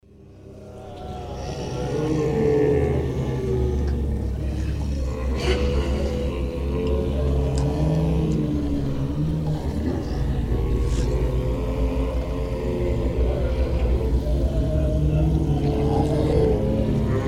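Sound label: crowd of zombies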